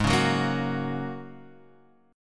Ab 9th Suspended 4th Ab down Guitar Half Step Down